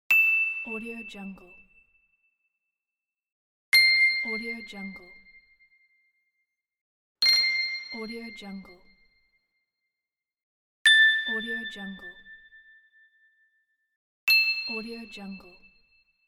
ding bell

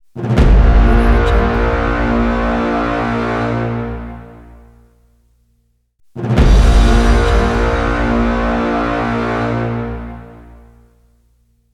horn; soundsground

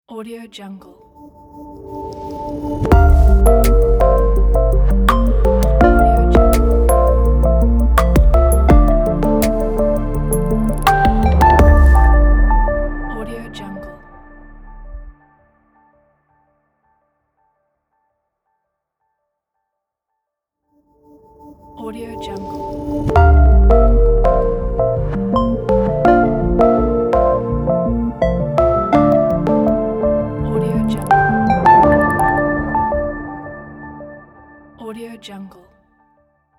silver tech logo

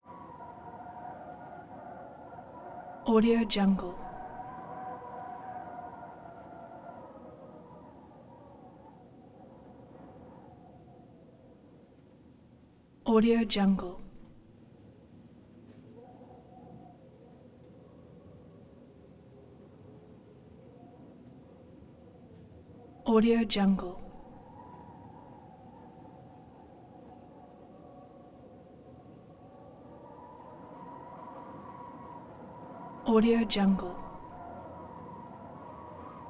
wind from window interior howling ghostly gusts

